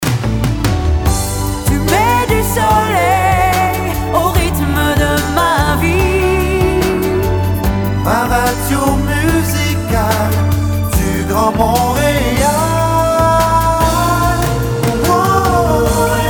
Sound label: SLOW JINGLE; RYTHME FM